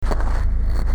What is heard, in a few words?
mic noise, ambience